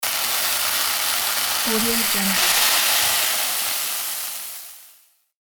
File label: sizzle